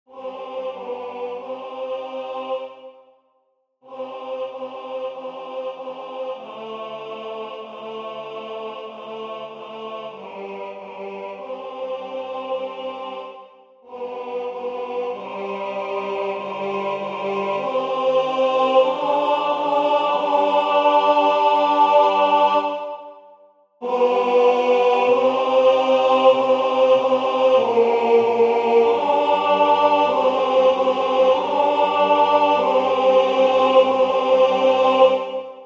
Je ne suis pas digne tenor

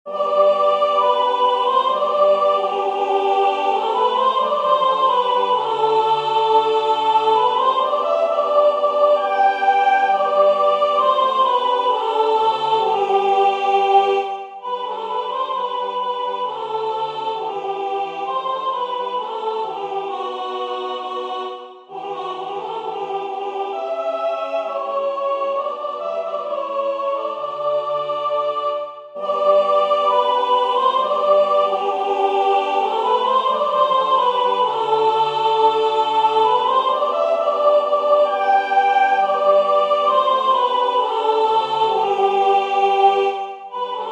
soprane; Cantate Domino Haendel

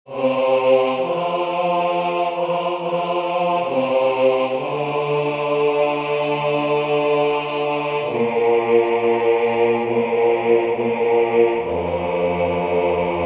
Je vous salue Marie basse

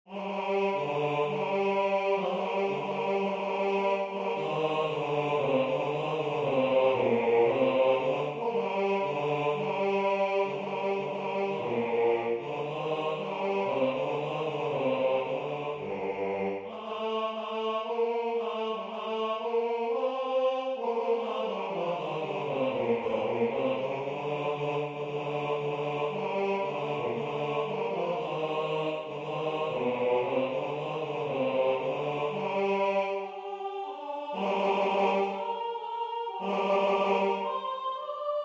basse; La marche des rois; Lully

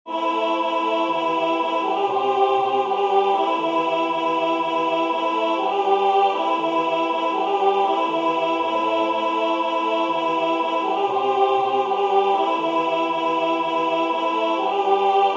alto; Gaudete Christus est natus

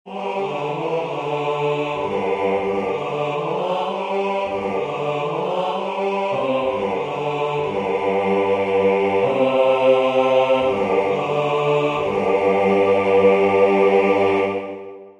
basse; Regina coeli; Aichinger

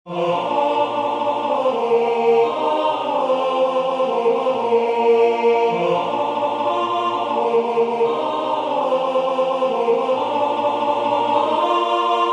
Venez bergers et bergères tenor

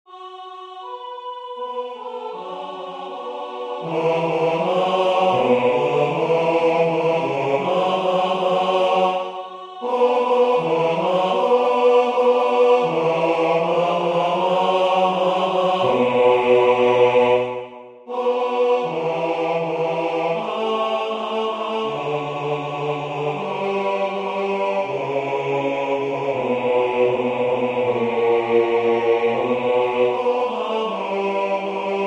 Maintenant Seigneur basse

